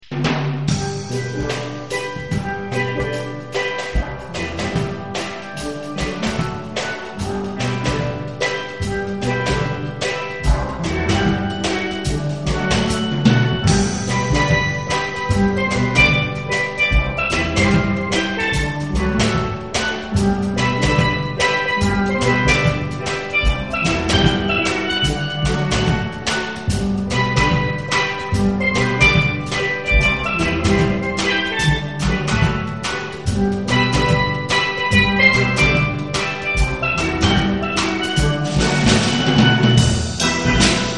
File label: Reggae